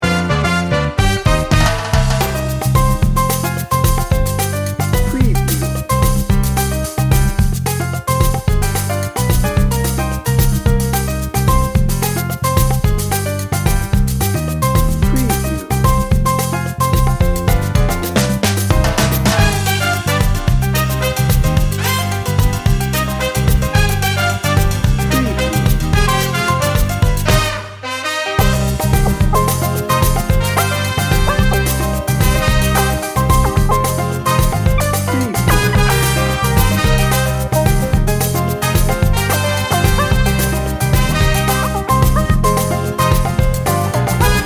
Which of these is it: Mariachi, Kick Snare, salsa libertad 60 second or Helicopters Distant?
salsa libertad 60 second